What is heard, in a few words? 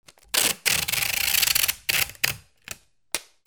OFFICE